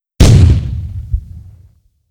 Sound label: Explosion